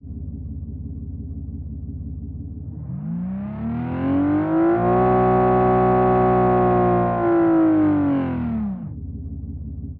v12 british, engine sound

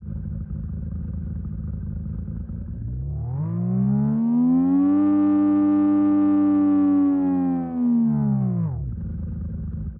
i6 japanese ext, engine sound

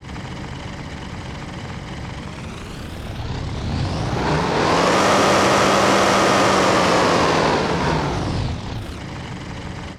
offroad, engine sound

